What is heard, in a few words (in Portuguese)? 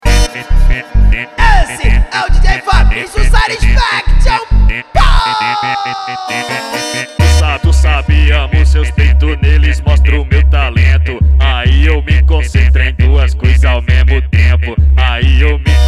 sound car